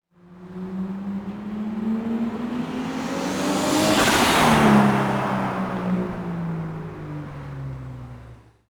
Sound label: Skyline GTR fly by; Fastcar Magazine; RK Tuning